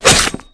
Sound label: knife hit, weapons